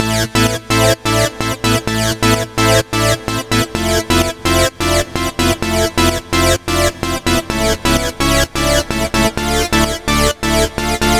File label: synth chords, Key A Bpm 128; Big Room House; Loop Nerds; Big Room House Allstarz